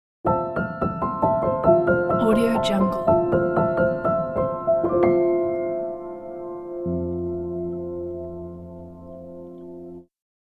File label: acoustic piano logo